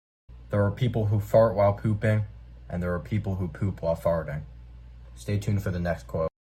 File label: poop fart guy